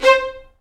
Vio Spic; Multisamples; Digital Sound Factory Virtuoso

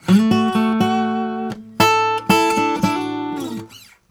Blues Guitar, LICK; ILIO; Fretworks

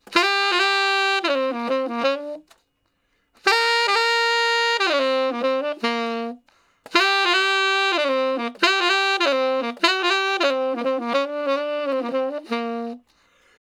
Sax Slo Blus (G I, IV, I, I), Ten Sax; Solos; Crimson Blue and Fabulous Horncraft 4 RnB; Horncraft for R&B

